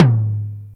subtle reverb tom g sharp, Loudest frequency: 360Hz